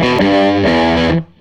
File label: SG Guitar Loop; Sonic Foundry; Six-String Orchestra